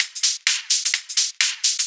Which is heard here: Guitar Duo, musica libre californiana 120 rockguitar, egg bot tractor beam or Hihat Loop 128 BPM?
Hihat Loop 128 BPM